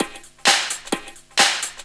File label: drum 130 straight; Techno Trance Essentials